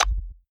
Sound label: mouse click, free spins; games; Corrida Romance; Wazdan